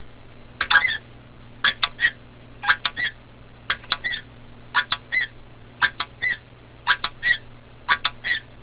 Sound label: Horn